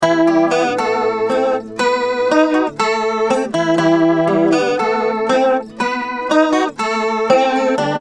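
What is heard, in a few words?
acoustic guitar next chorus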